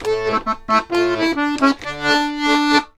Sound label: Accordions; USB Soundscan vol.40; AKAI